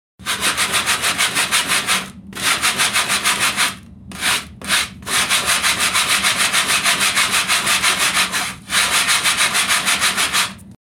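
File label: sandpaper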